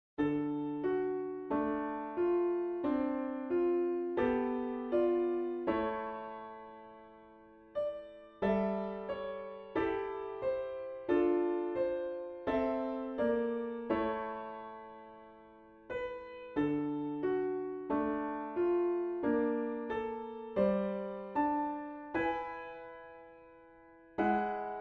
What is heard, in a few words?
lullaby